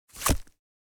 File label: Wallet removing credit card; purse